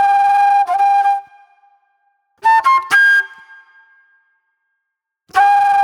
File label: mellow soul flute